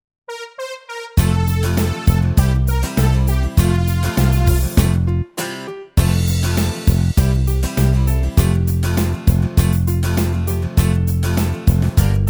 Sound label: Karaoke